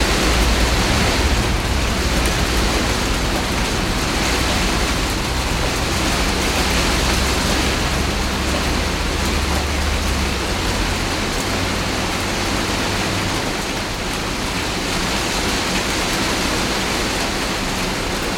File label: heavy rain on greenhouse recorded inside greenhouse